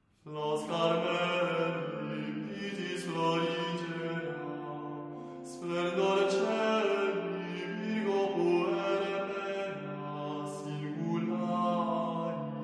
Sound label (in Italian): Canto Gregoriano; Flos Carmeli; Catalogo musicale musica antica